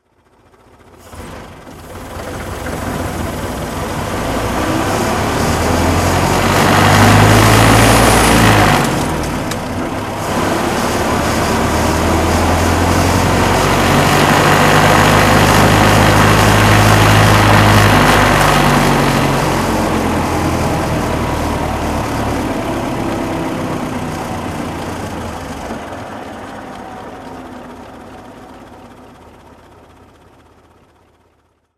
stutz series k driving, engine sound driving; Stutz Series K DH open tourer, 1921; V3D/ ClassicarImages; Marc Vorgers

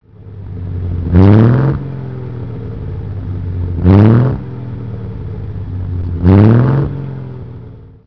alvis sp20

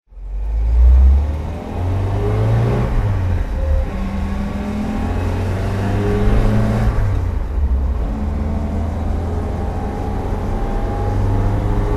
volvo 1800es driving, engine sound driving; Volvo 1800 ES, 1973; V3D/ ClassicarImages; Marc Vorgers

